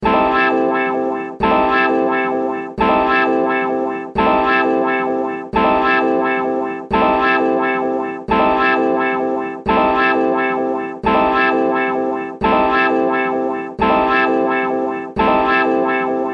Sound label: Strum Mellow